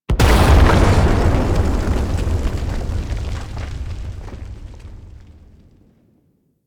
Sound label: Wyrm Debris Impact; Enemies; Warframe; Infested; Microplanet Sun Monster